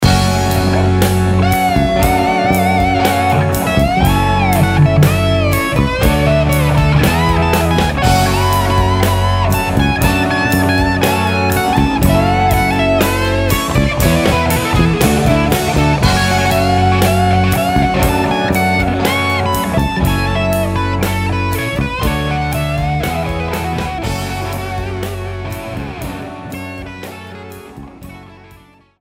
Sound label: F sharp Aeolian Derivative